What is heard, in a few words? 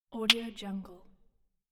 finger snap